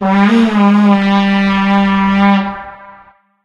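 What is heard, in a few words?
vuvuzela; source engine; tf; football manager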